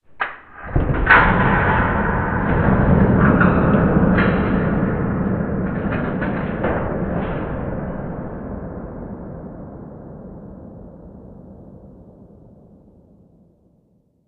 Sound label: big hit far